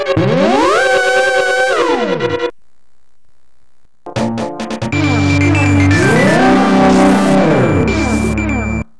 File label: Warp SFX